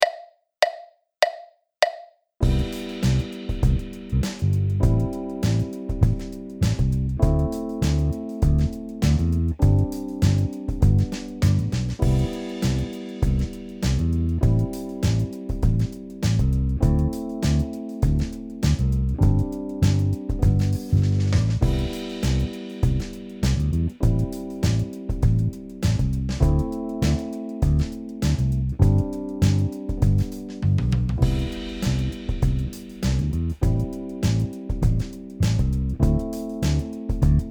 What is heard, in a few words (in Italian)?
Sedicesimi 100 BPM 8 Bars